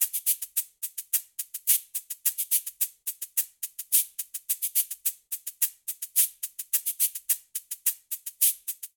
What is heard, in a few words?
Afoxe Cabasa 107bpm, Latin Percussion; Ableton; Factory Packs